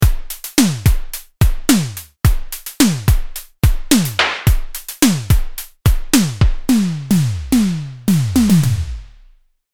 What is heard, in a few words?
Drum